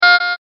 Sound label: multimedia rollover, Multimedia Rollover Tone